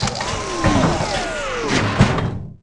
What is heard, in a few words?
Mech Death; Tallan Mech; Aliens